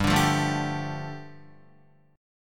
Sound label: G 7th Suspended 2nd, Guitar; G7sus2 strummed